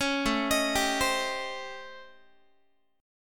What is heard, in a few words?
A 7th Sharp 9th Flat 5th, Guitar; A7#9b5; arpeggiated; JGuitar